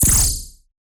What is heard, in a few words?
Laser